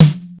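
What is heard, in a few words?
snare drum low; SNAREDRUMS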